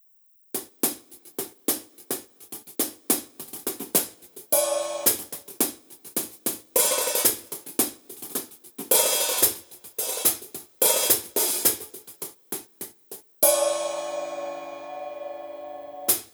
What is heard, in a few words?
brushes 13in hihats